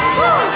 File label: car horn